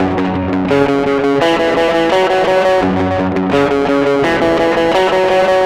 Guitar, Trip-Hop Loops

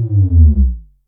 JNG BASS, Yamaha DTXpress

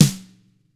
TAMA SNRD, TAM HIT; ILIO; Double Platinum Drums 1

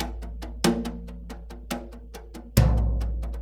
Earth Loop, Drum Tools, Laid Back

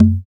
CONGO, African Perc; Spectrasonics; Supreme Beats; African Contemporary; SB Afro DRMs

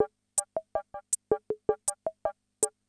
CR78; Best Service; XX Large Most Wanted

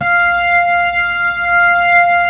B-3, Keyboards; Keyboards of The 60's and 70's; Full Xfade

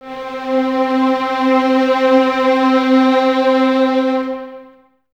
violins C 4, String Ensembles, 23 Violins; Miroslav Vitous

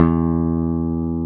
BAS E2; Best Service Gigapack 2

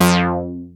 ARP 2600 F4, synthesizer; Hollywood Edge - Giorgio Moroder Rare Synthesizer Collection

